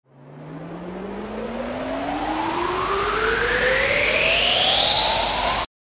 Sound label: RISER